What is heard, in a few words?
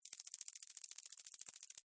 Millipede Walk, Millipede, Walk, Movement, Insect, Bug; Millipedes; Animals:Insects; Blastwave